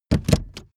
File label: car seat slide backward short